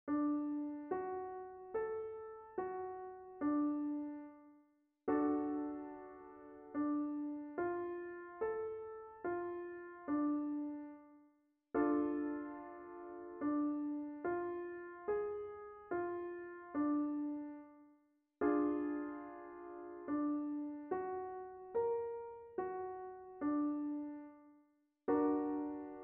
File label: Triads